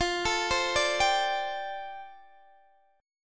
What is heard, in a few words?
F 6th Add 9th, Guitar Open D